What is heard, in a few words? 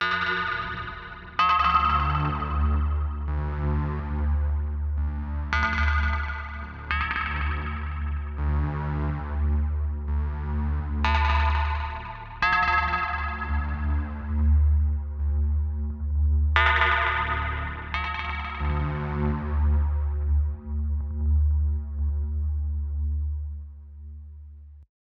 Atmos; neuro; Optiv & BTK